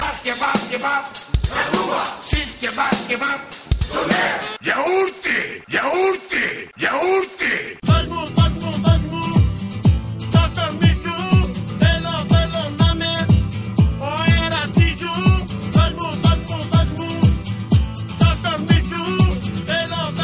remix greek

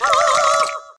witch summon

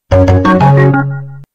soft sms; ringtones

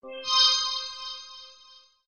htc notify; dream; ringtones

